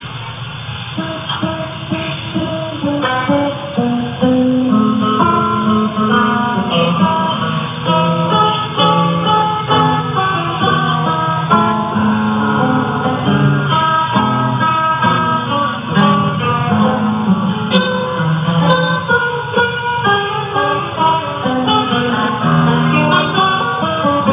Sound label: fuga, guitar